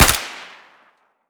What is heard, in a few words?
fire suppressed, weapons; cw m249